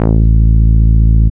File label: BASS; X-Static Goldmine 1